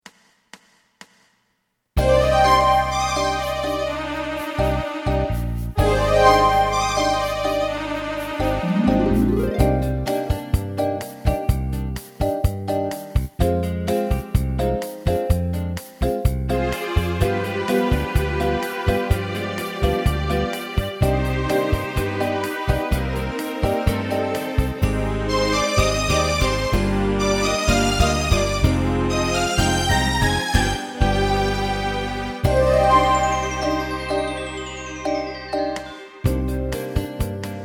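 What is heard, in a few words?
karaoke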